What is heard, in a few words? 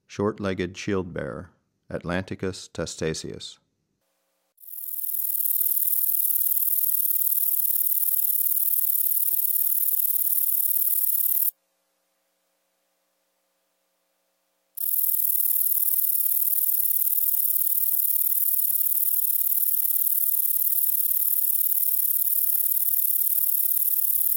Shortlegged Shieldbearer, Atlanticus testaceus; Crickets and Katydids